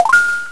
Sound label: fill; Réflexion; El-Ixir™ 3.0.3